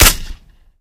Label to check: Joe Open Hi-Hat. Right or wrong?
Wrong